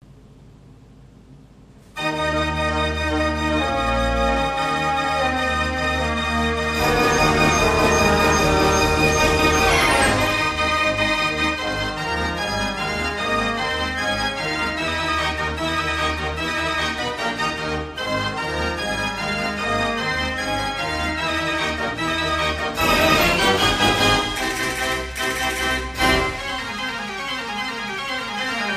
concertorgel; 118 key verbeeck; victory on tour through europe

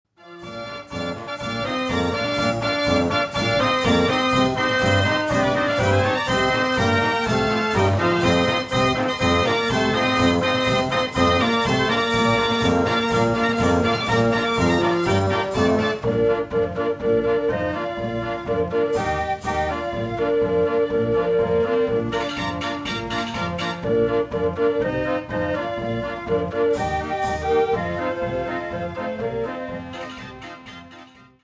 mortier dansorgel; nr 741

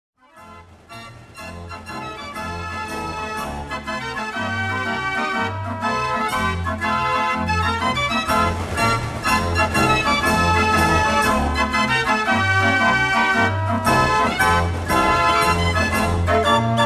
De Jeep van Jansen, draaiorgel; de klok; dureco

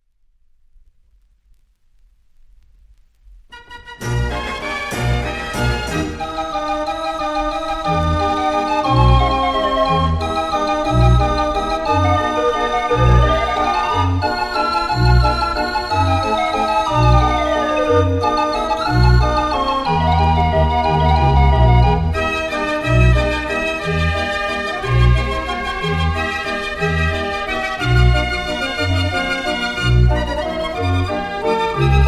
draaiorgel; de lekkerkerker uit gouda

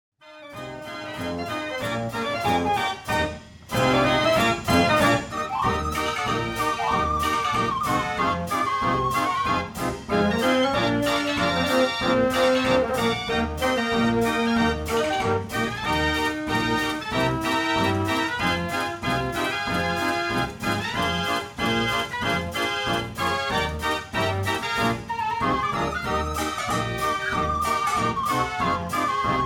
mortier dansorgel; no 741; Simon Loopstra volume 3

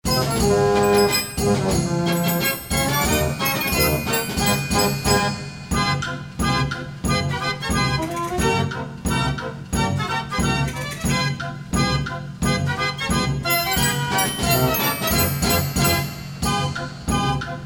draaiorgel, gavioli; draaiorgel de waterpoorter gavioli

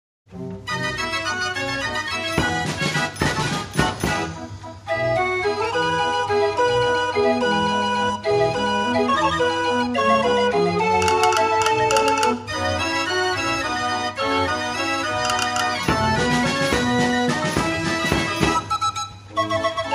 draaiorgel; atalanta

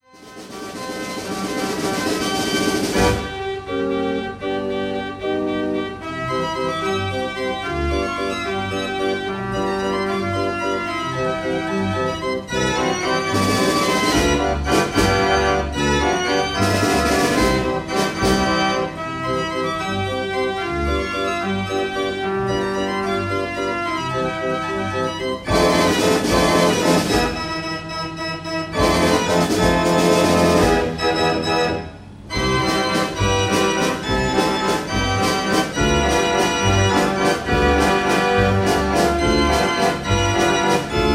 concertorgel; A. Ruth no36

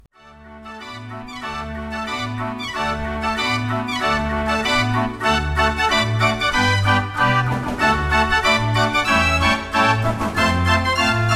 de klok, draaiorgel

